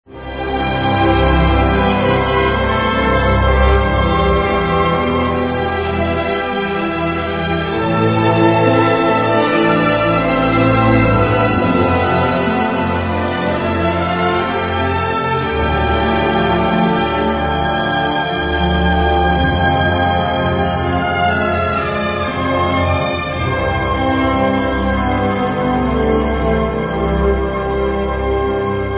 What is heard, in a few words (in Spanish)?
musica peliculas